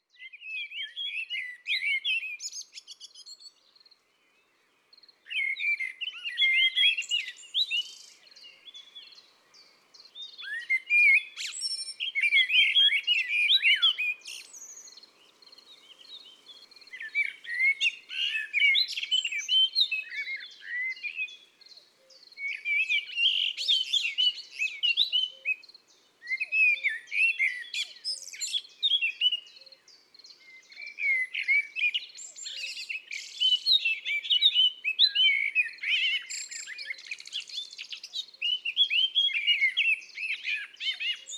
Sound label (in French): Merle noir et fauvette a tete noire, Chants d oiseaux des bois et des forets